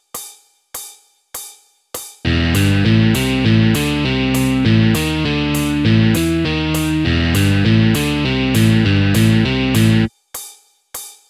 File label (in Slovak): Motiv tempo 100